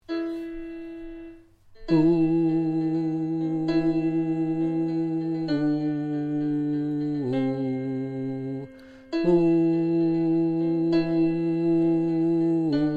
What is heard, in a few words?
Alto